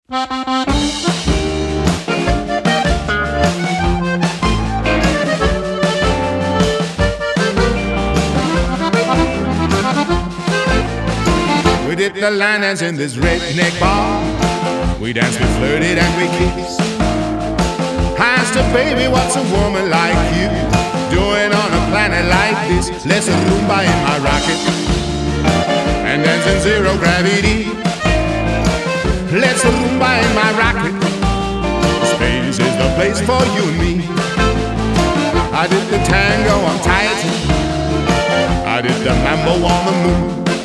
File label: rumba